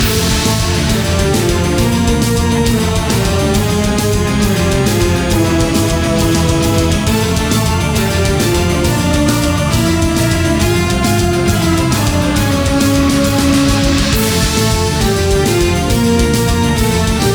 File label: Bar Fight; Orcs Must Die